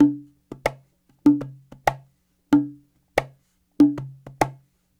Cahon

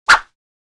swish single; Asian Show Sound Effects

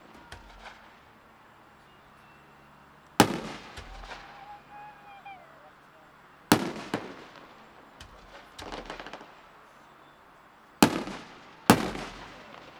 fireworks; Ambisonics

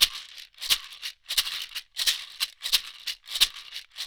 Rawhide Shakers

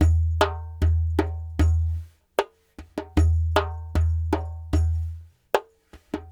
Lg Dumbek

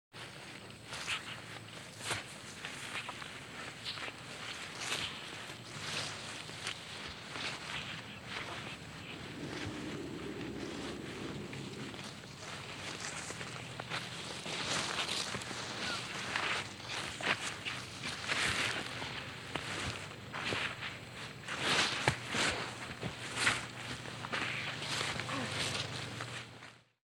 one person walking, Grass and Dirt; Butterfinger Video Sound Effects

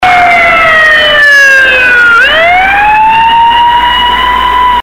police siren

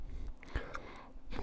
breath

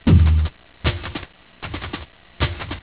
drumloop; This loop is one of the interesting loops that I found on internet

